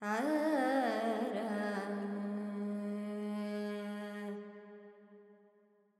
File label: Carnatic Female Alaps, Purvi Kalyani, 100 G, Verb; Sample Banks